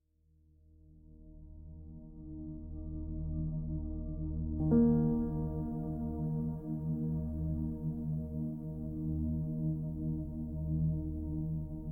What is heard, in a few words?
Ocean Breeze, Sleep